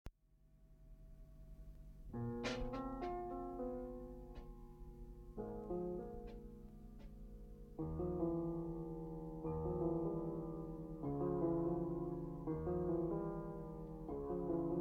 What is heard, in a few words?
Piano Percussion